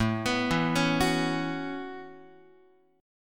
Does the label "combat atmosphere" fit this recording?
No